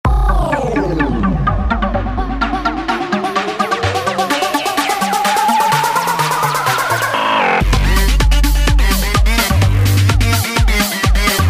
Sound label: summer edm